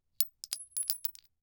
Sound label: Weapon Bullet 9mm Shell Drop; AdobeCC